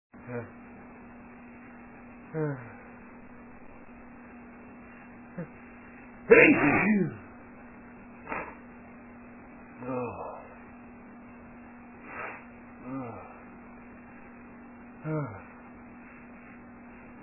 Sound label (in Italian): Sternuto, male